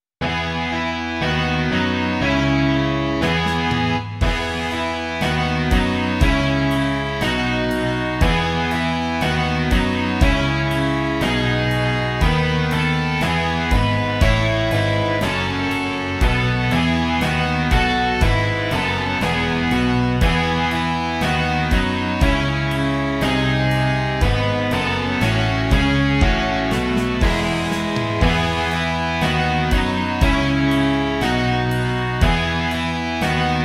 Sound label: rock